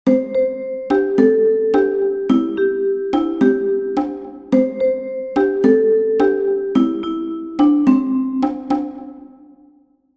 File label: Cajon